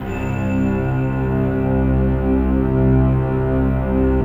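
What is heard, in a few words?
C 2, BELL STRINGS; Best Service; Advanced Media Trax Modern Composer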